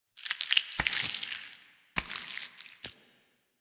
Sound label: Proto Infested Volatile Runner Taunt Flesh; Enemies; Abilities; Warframe